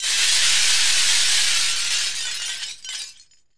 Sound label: glass wish